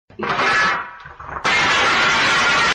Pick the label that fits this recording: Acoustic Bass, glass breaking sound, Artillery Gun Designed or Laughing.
glass breaking sound